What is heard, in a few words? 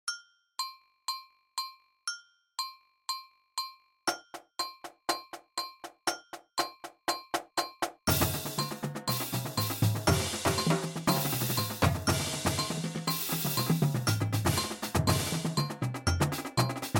Tiger Rumble 120bpm, drumline